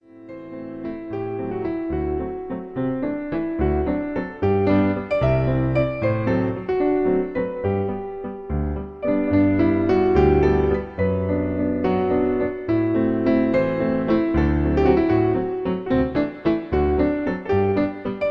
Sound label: marino waltz